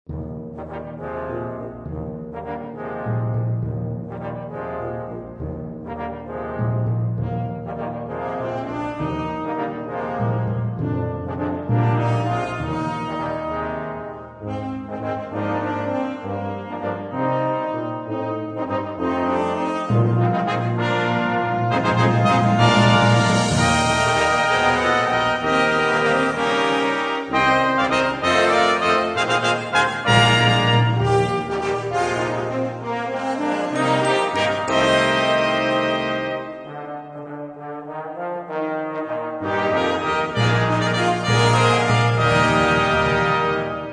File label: brass choir; There Shall Be Showers Of Blessing